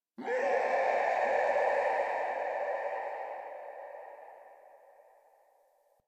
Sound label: Chatter Painful Scream; Ambience; Misc Outdoor; Warframe; Infested Eris